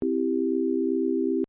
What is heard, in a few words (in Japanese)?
サイン波CEG